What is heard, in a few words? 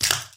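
crunch of glass goblet in hand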